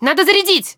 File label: attack many, human woman fight attack; Stalker Anomaly